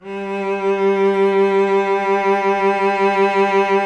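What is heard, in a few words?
strings violoncello G; Miroslav Vitous; Solo Instruments VOL-1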